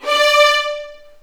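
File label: D5 violins; The Ultimate String Collection; East West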